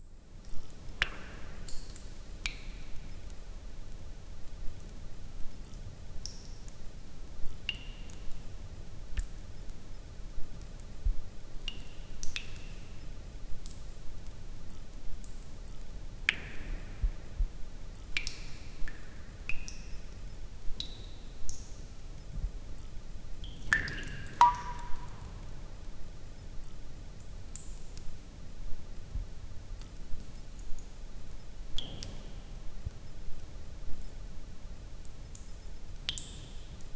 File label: in an 8 tatami sized conc, underground